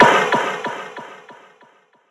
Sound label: Retry Jingle; friday night funkin hazeys friday night chillin midnight series ost mod; Dissolving Dreams